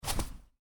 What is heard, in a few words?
Footstep snow boot, Boots: Individual Footstep On Snow, Heavy; Human; Stuart Duffield